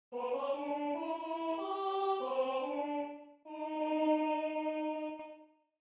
alto

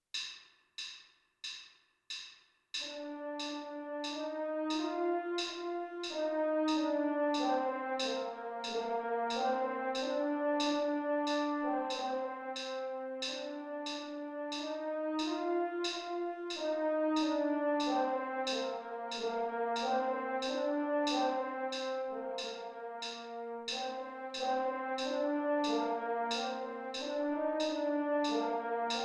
french horn

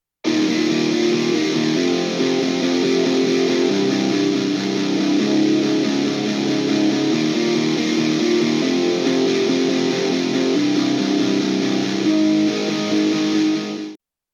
electric guitar distorted

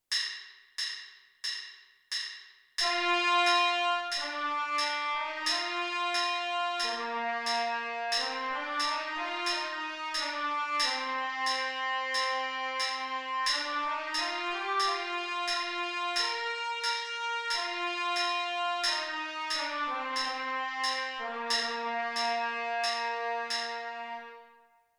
trumpet part a

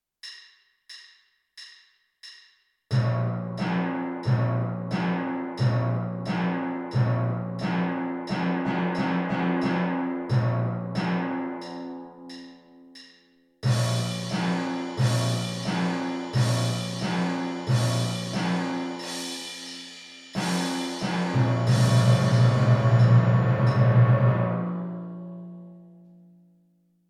crash cymbals timpani; the conquering hero

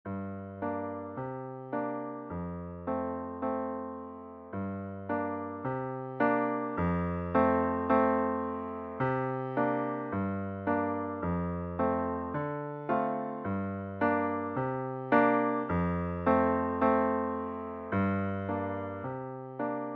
piano only; for electric bass

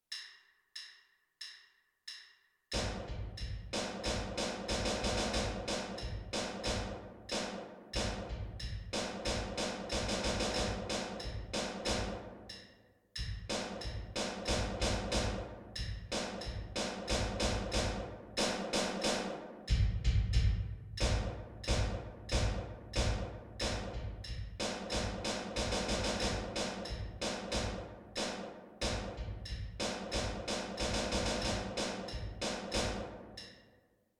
alouette snare drum bass drum

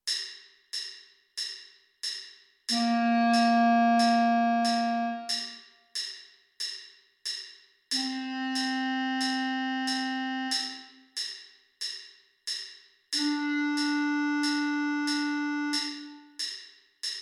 clarinet

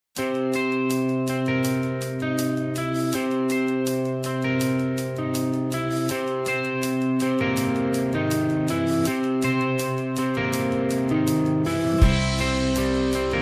musical theatre karaoke; school of rock

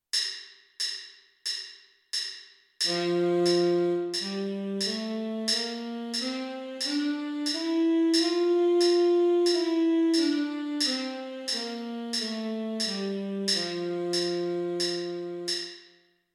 g major scale tenor sax

